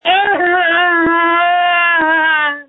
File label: screams